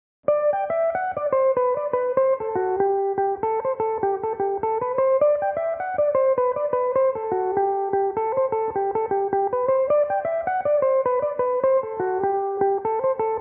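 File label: G major, irish mandolin, tunes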